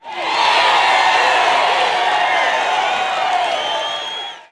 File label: Cheer